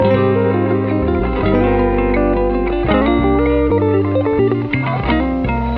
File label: guitar, loops; Phonky